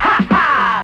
"ha ha"; Voice; ooh ahh

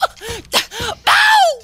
uh uh ow, Voice ooh ahh ow ouch

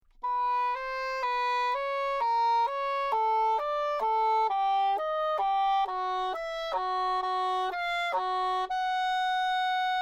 Horns

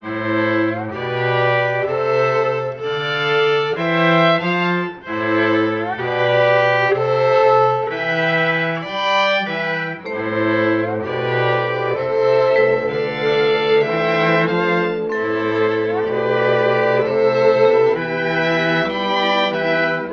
strings vibes, Sad; Loops

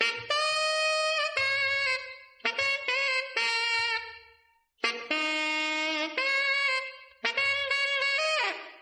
sax bass, Loops; Phonky

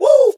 "woo"; Voice; ooh ahh

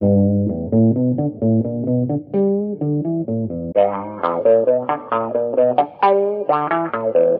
guitar, Phonky Loops

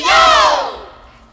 "yo", crowd; ooh ahh

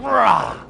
growl, Voice

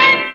warble, Synths; Notes

